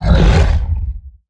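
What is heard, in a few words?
Stealth Attack; Evolve; Behemoth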